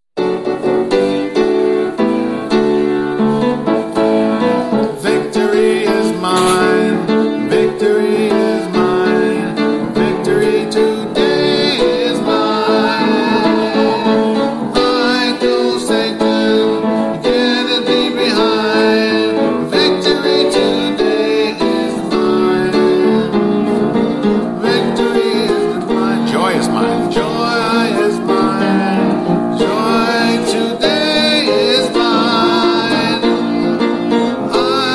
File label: Sopranos; choir